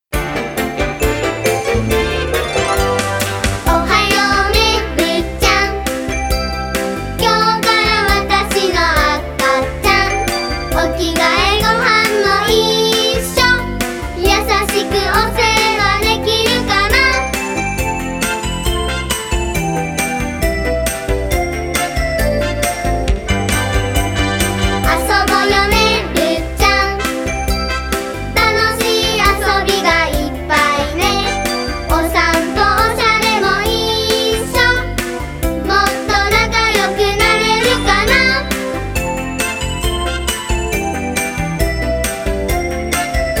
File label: original full gassho